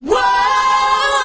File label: "woah" SUNG